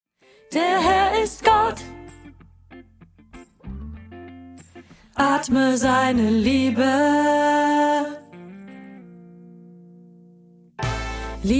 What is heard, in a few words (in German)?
Lern Sopran1